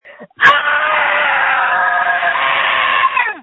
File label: screams